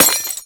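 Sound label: refrigerator bottle break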